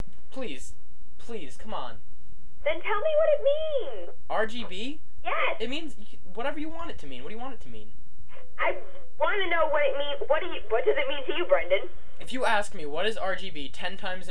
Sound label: HAHA